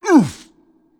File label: hitting wall; bumbino